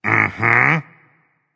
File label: agree berserk; cuchulainn